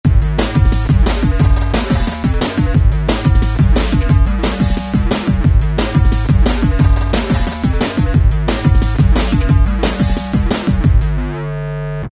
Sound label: Rave Jump Up, Korg ES-1 Factory Patterns